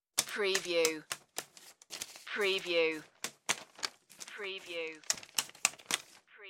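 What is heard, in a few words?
Handling Paper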